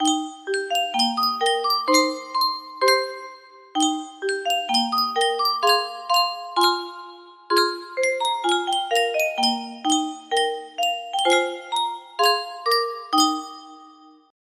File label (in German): Yunsheng spieluhr